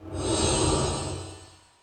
Lotus Underlay Attack

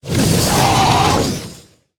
Abyssal Enforcer Play

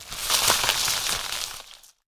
Foliage Play Underlay

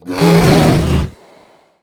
Chillwind Yeti Death

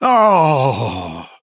Male Chess Piece Death

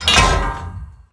1H Epic Mace Underlay Death

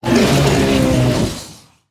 Abyssal Enforcer Death

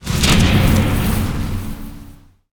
Ethereal Mount Death Underlay

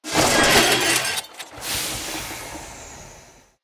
Snow Chugger Death Underlay

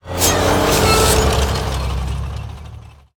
Vehicle Tank Underlay Attack

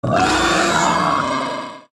Razorboar Death

